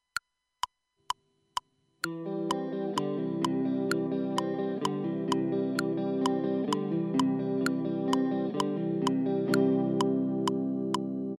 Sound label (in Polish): wstęp akord C